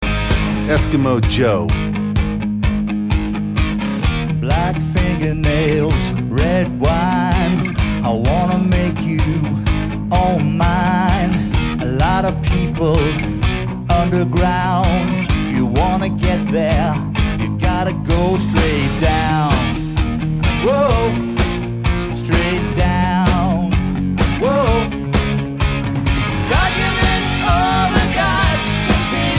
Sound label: pulse